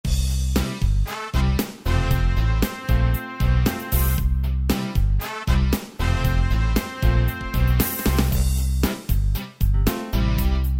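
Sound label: SAL BLUES C major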